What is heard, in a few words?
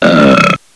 BURP